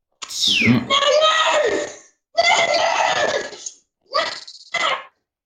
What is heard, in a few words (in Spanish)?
grito